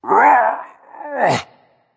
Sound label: grunt lift berserk; cuchulainn